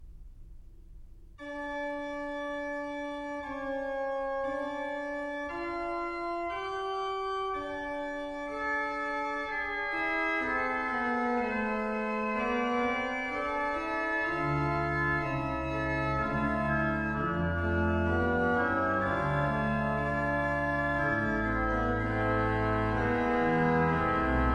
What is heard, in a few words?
Christ ist erstanden, BACH ORGAN; Chorale preludes; BWV746; Orgelbüchlein